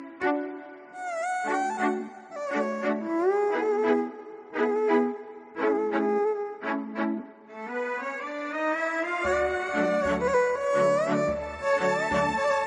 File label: Instrumental Ringtone